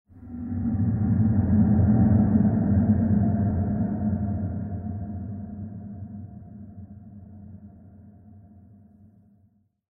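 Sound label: short ambience; crysis warhead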